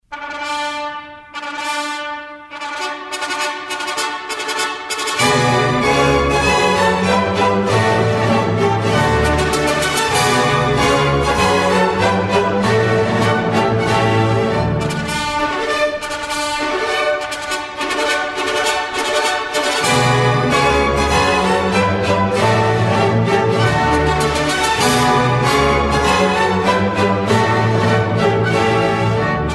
tono nupcial

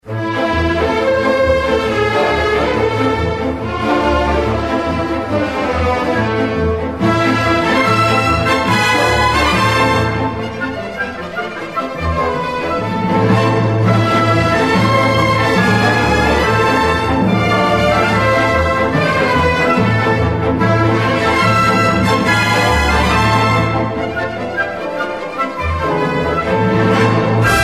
tono danza hungara 5; brahms

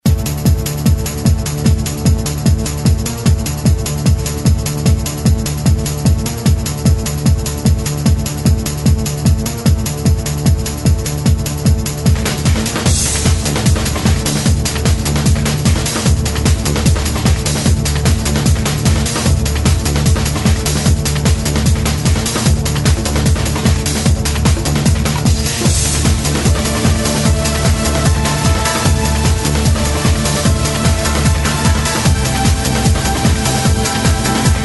tono sony ps2; nightsade kunoichi; 2004; sega

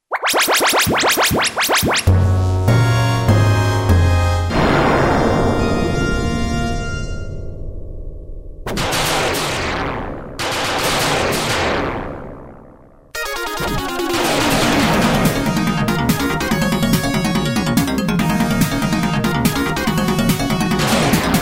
tono arcade; contra; 1987; konami

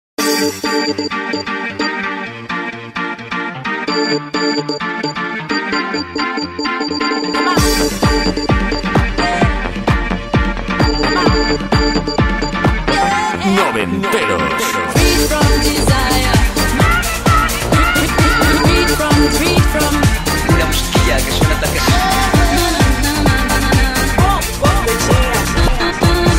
tono noventeros

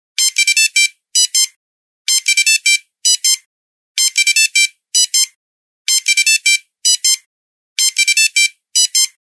melodia

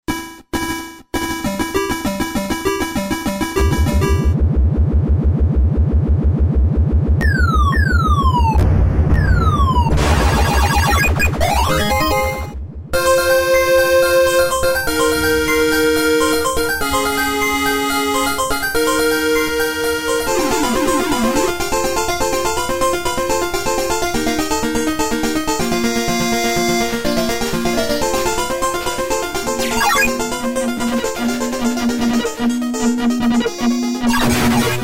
tono marcia

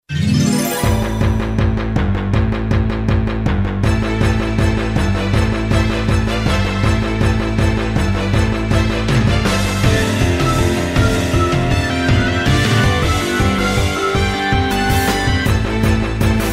tono psx battle; final fantasy ix

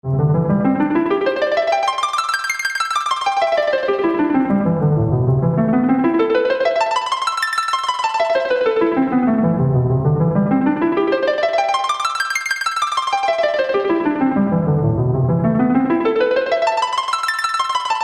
preludio; final fantasy iv; snes

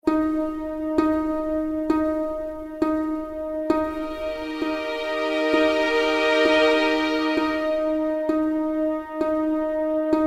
tono danza macabra

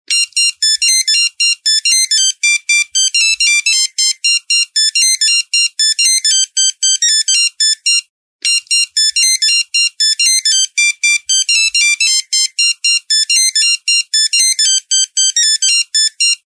melodia

